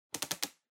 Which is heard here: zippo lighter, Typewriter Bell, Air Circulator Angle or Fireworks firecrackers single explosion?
Air Circulator Angle